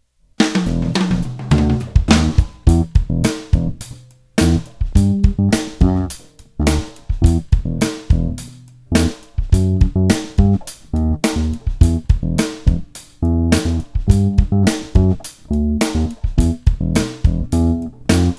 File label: Bass Sample